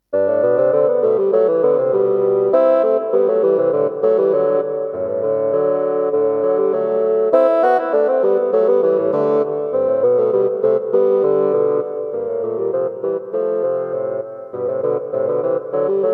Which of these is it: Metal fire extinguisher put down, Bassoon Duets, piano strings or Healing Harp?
Bassoon Duets